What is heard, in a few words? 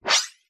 negative game tone shield break sound fx arcade casino kids mobile app; epic stock media